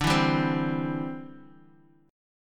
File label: D 7th Suspended 2nd Suspended 4th D, Bass Standard; D7sus2sus4 strummed